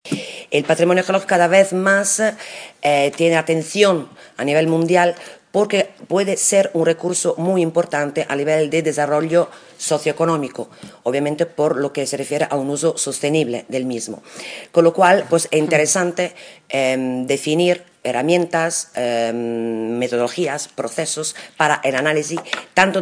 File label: Viola; Bruschi